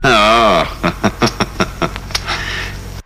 laugh

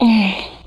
"mmm" female, Voice; ooh ahh

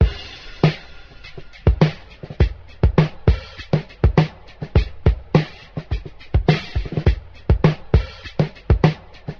suspense, drum loops, funky

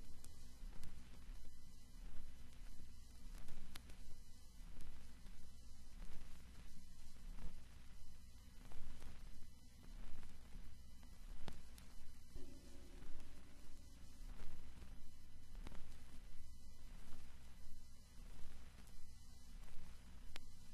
crackles, Vinyl

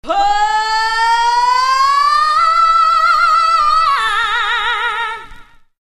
"hoo" SUNG, voice; ooh ahh

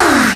"ah", Voice ooh ahh

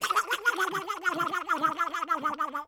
squeeze rubber ducky

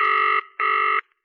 phone busy

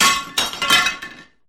glass shatter

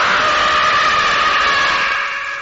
scream nongender long; ooh ahh

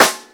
metalurgy, snares real

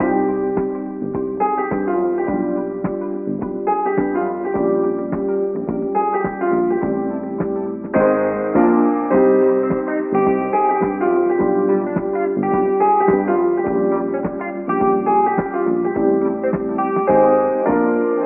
piano and guitar, Loops; Phonky

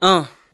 uh

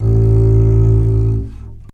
double bass; String

